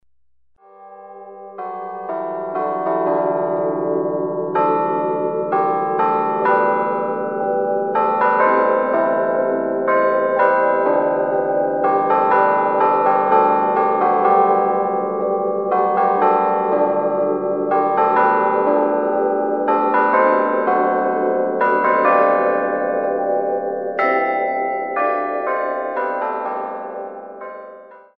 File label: Harp